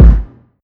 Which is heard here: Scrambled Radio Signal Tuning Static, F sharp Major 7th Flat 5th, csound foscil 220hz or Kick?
Kick